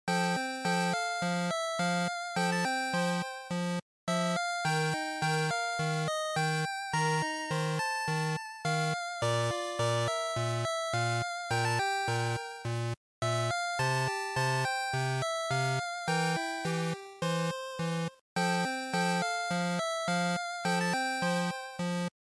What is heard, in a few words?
GB RPG Title